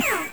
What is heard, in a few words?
hit; madcars2; radicalplay